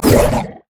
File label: mariner bomb; LoL; fizz skin04